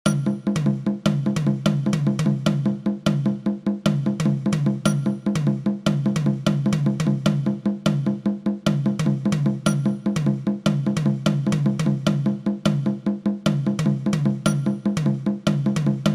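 example long bar with polyrhythm and normal rhythms; tunesmithy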